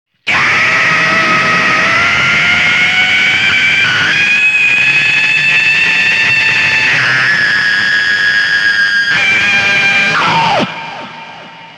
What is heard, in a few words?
Ahh